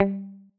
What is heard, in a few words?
pluck